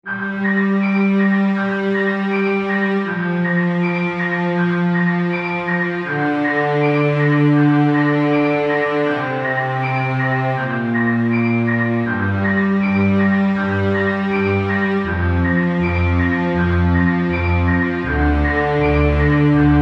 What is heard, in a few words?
Unfinished Instrumental